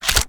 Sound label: reload loop fast load; gmod